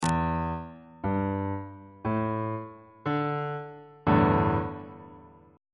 g double sharp dominant 7th suspended 4th 2nd inversion on bass clef